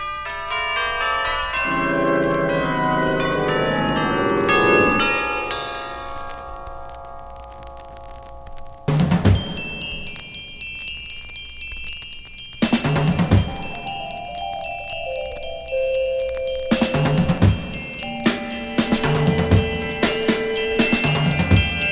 psychedelic percussion

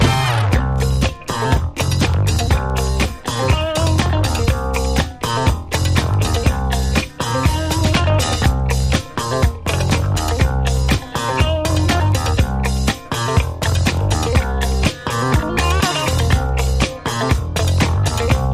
BOOGIE WALK INSTRUMENTAL

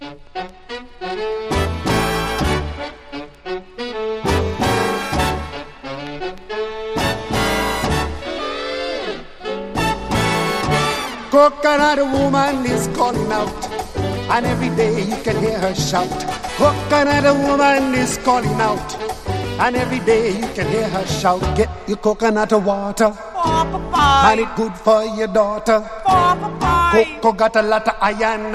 CALYPSO IN BRASS

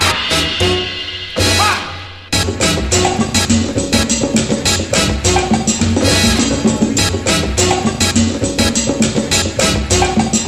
UPPER MAMBO LOWER FUNK MEZCLA SENTIMENTAL; latin; MOTOWN

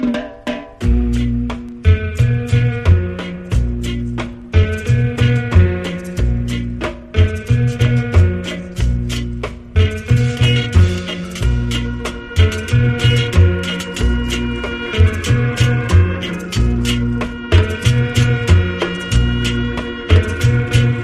DRUM SONG; reggae

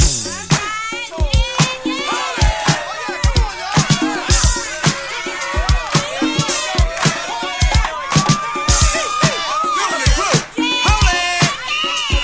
INSTRUMENTAL

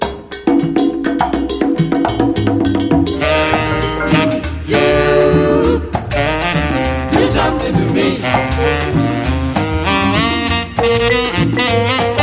SAX GONE LATIN

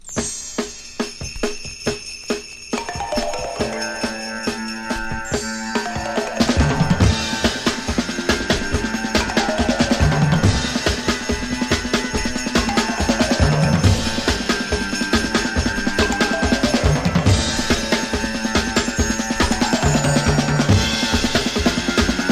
psychedelic percussion

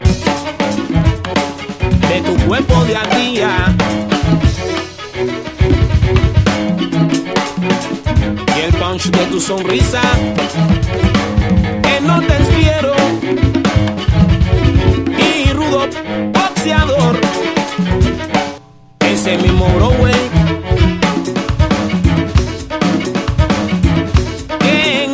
COMBO PIANO

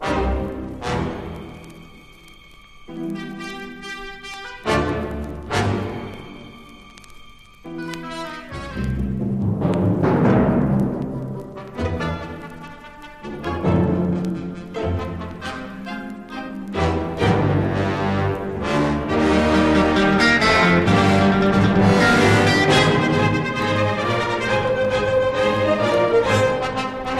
GUITAR; sampling source

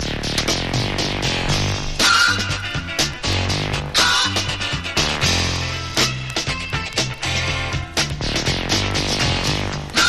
MOOG POWER; 1979; sampling-source

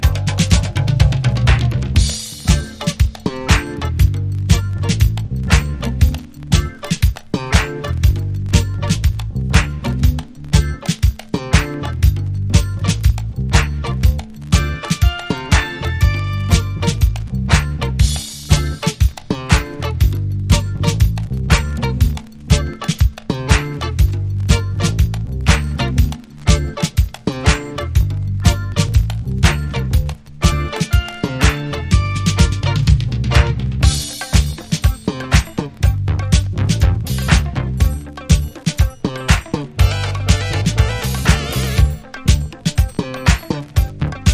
INSTRUMENTAL

